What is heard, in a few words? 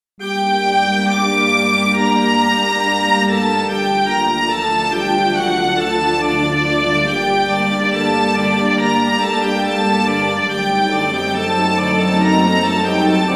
fugue